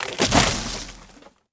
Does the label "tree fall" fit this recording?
Yes